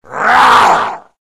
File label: knight attack; halloween boss